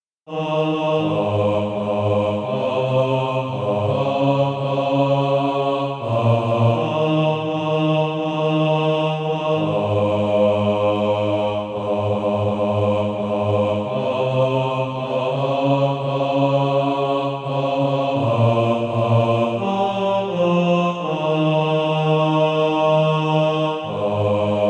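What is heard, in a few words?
bass, Practise Music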